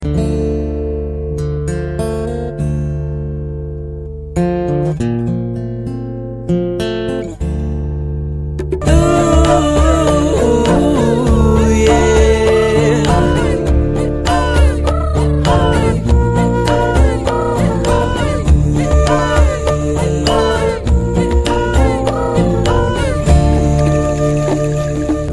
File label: reggae sounds